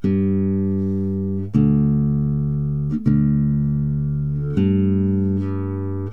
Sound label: main bass